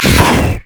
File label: dragon basic attack, monsters; LoL